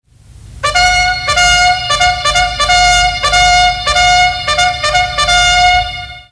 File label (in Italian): allarme